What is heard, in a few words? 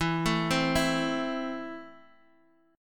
E Suspended 4th Sharp 5th, Guitar DADGAD; down